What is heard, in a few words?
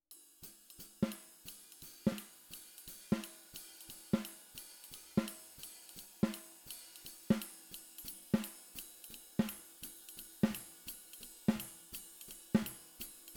SNR